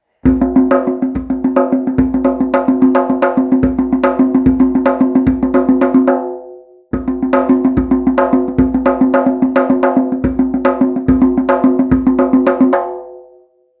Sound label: Sample 6 8 fast